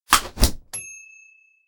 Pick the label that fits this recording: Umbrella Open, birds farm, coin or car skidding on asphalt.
Umbrella Open